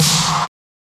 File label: Snare